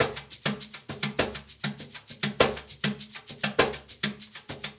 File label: Cajun, Percussion, Drums; Essential Percussion